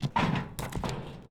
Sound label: Machinery